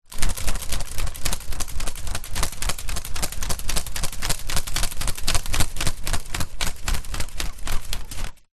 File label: household hand fan movement fanning